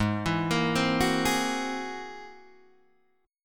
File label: G sharp Augmented 9th G sharp Guitar Open D